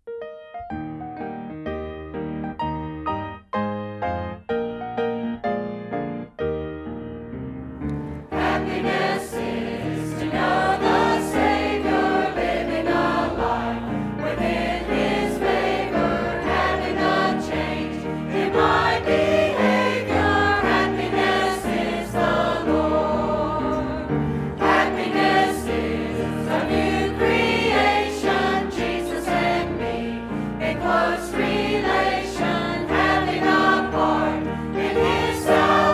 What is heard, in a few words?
Choir